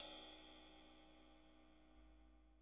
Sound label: CYM, JAZZDRUM; Ueberschall; Drum & Bass Flash Trackz